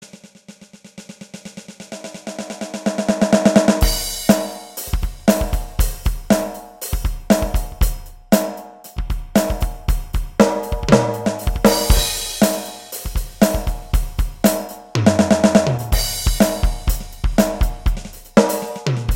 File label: whinsnare; drum kits; Roland TD-8 and TD-6/6V drum mo; Session